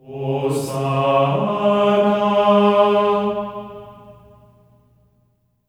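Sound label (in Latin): hosanna C#4; Spectrasonics; Symphony of Voices; GR HOSANA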